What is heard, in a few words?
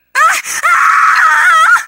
scream